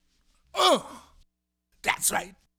Vocal, 70s Analog Funk

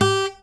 pop, Guitar; 90 s Sample CDs; Quantum Leap - Guitar & Bass

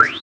808 Snare, Kit

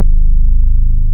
BAS Mini, BAS1SYN; Best Service Gigapack 2

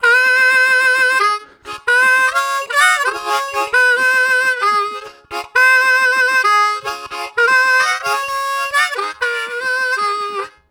Throat Kick, Harmonica Riffs; Zero G

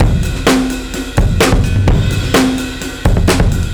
Jazzy Drums 128; musicradar

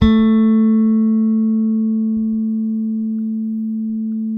bass, sustain; Sampleheads; Will Lee Bass Library

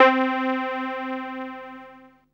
SIEL, String Machines

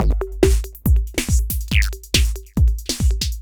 808 weird; Sonic Foundry (Sony Creative Software); Ugly Remnants VOL-2

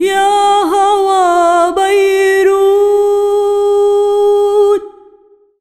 SINGER, ArabianTraditions

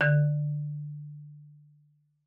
marimba D3; Xsample VOL-4

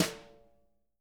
BRUSHES, Living Drums

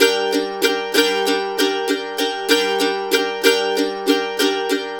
RIFF, Blues Guitar Samples; ILIO; Fretworks; 90's Sample CDs

